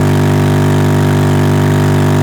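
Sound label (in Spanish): MOTOR MARCHA YBR, motorcycle; YBR125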